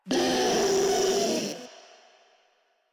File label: Infested Ancient Vocal Attack; Warframe; Ancient Ninety Nine; Proto Infested Ancient